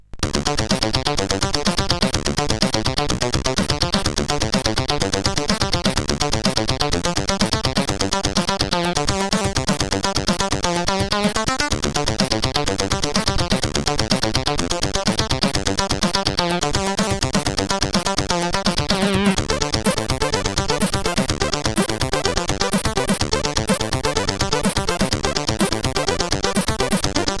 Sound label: 2-Voice Level, sid CSG8580R5